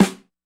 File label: snare, Acoustic